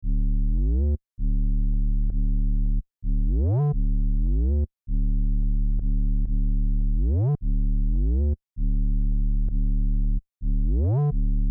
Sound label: Loop